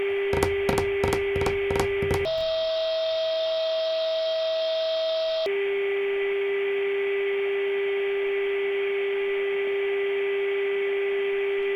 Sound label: recording handsfree tapping changed pitch